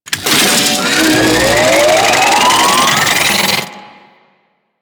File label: Spaceship Turret Charge Up; Warframe; Grineer Pursuit Space Ship